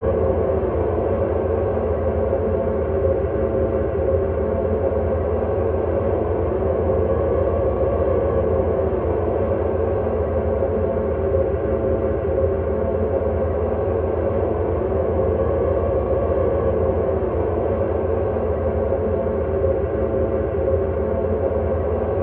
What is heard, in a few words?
Ambiance Tonal Airy